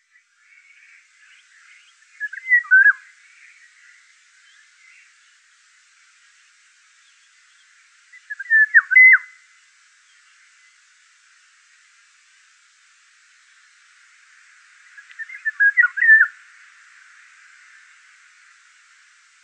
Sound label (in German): Pirol Oriolus oriolus - Gesang; Bergmann